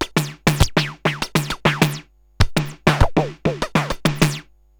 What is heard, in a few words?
Moog Freqbox RX17 (100BPM), cyborg beats